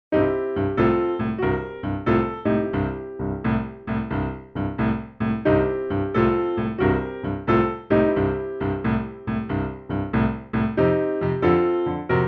Blues Exercise

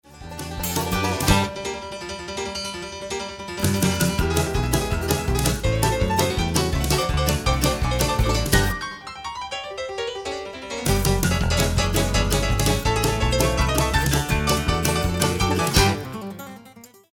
piano solo

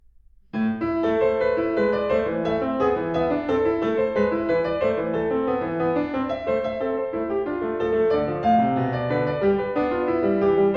Bach Invention 13 in A minor

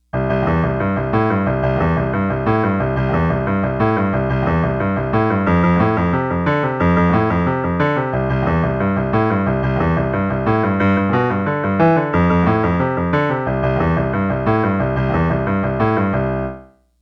Left Hand Style

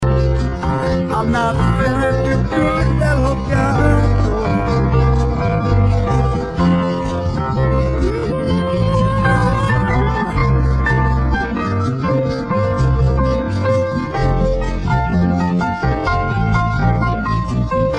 Solo & Break

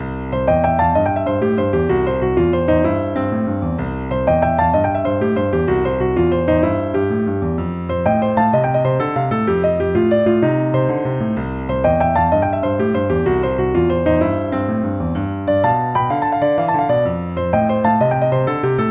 Exercise in Flips

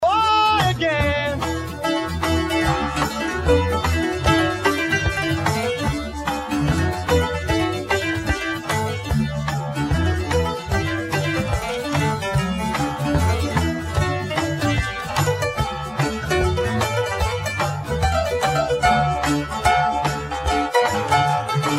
PIANO SOLO

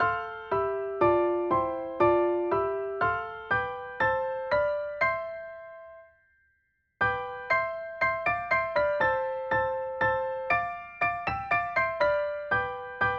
Bells on the Piano Exercise

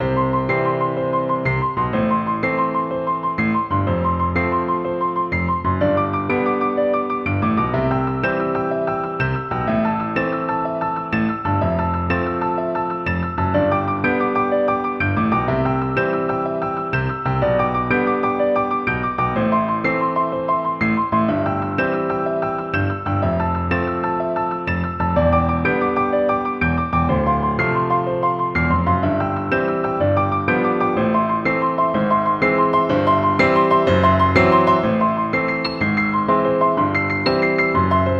Right Hand Lateral Motion Exercise

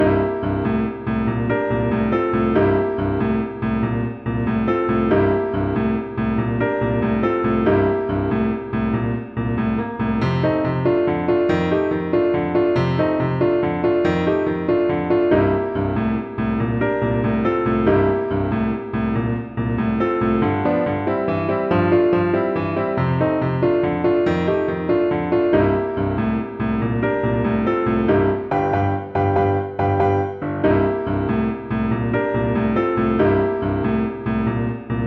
Blues Exercise - Alternating Hands Lick

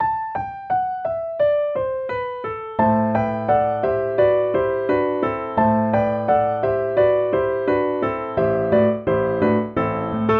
Exercise - Harmonize a Scale

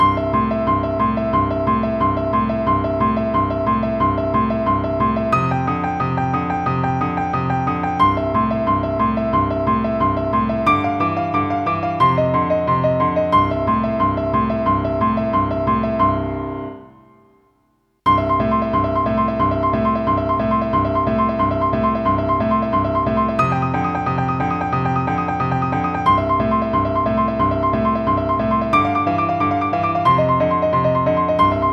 Tremolo Exercise

